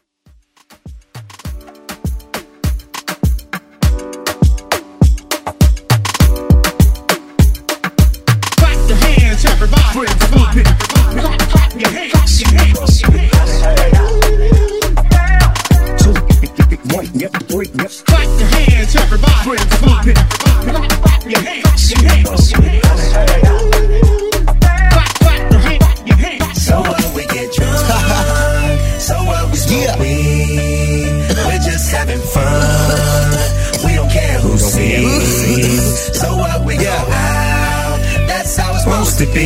Moombah Hype

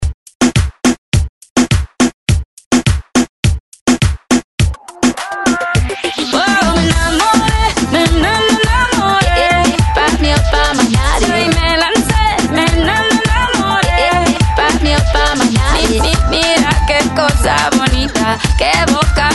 Reggaeton 105Bpm

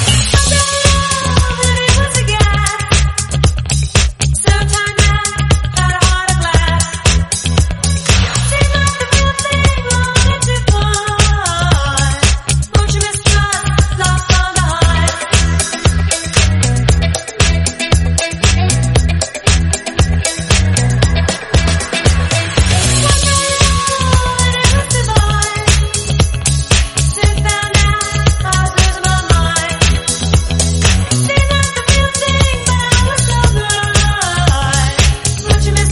116bpm E

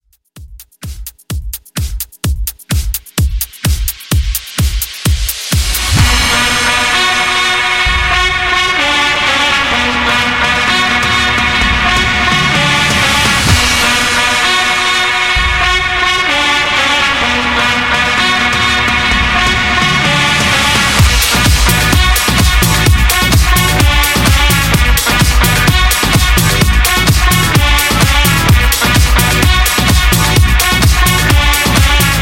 Club Mix 128 Bpm

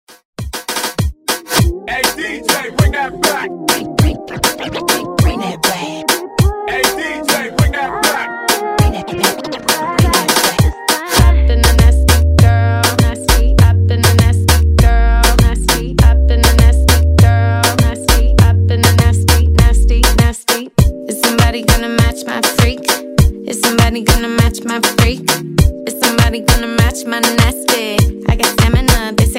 Moombahton Redrum

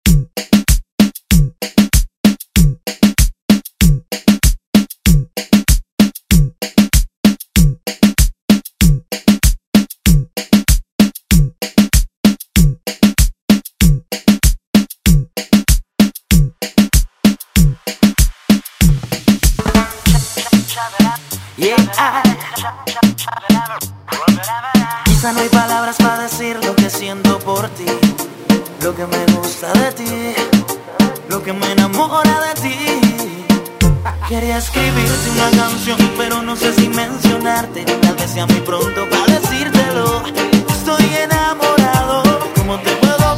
Latin Urban Redrum; Deejay Hit

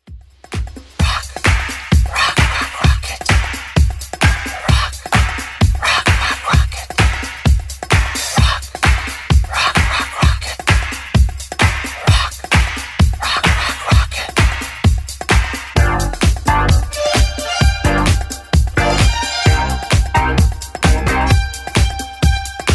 80s Club Edit 130bpm

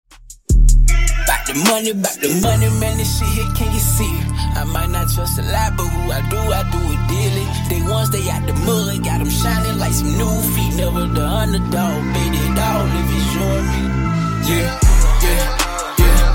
Funk 77Bpm

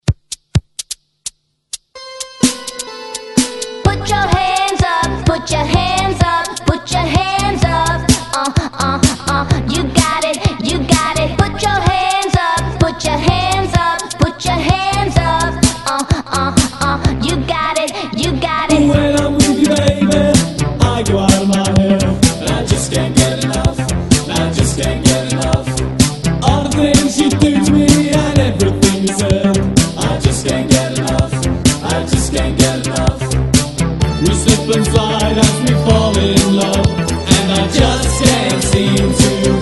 Hype ReDrum; DJDOLLS

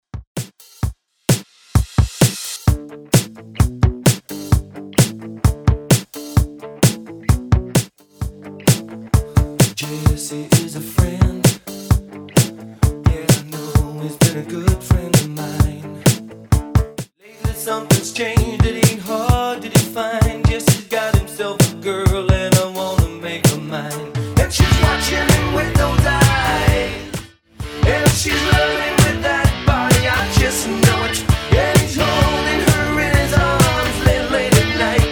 80s Rock Redrum 130bpm

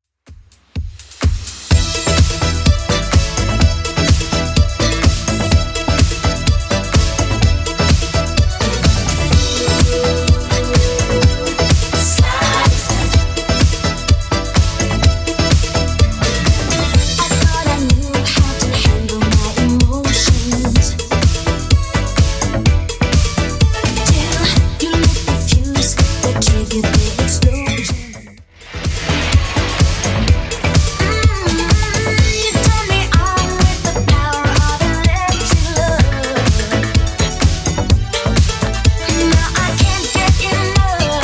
80s Clubmix 126bpm

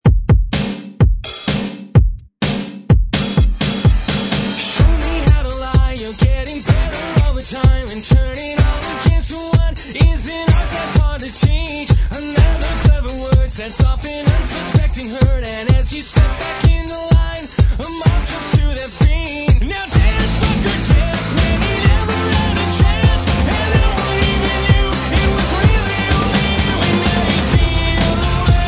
Rock

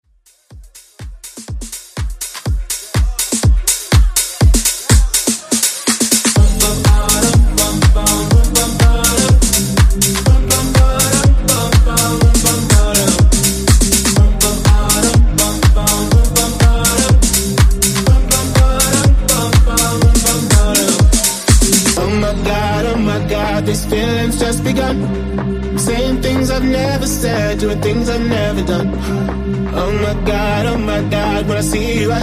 Top40 Deep House Mashup

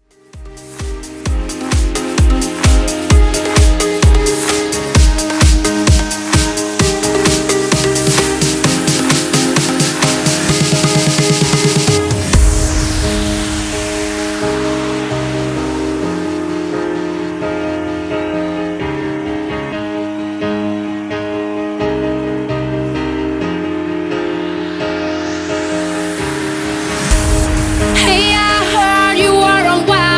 Trumpet House 130BPM; remixplanet

